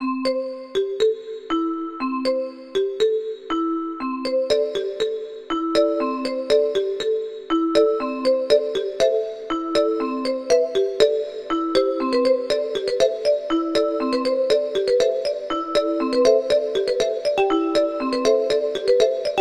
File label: marimba